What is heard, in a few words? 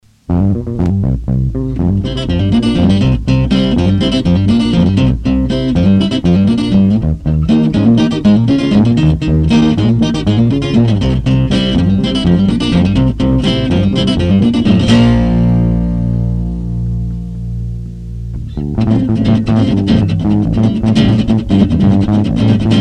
Blues Funk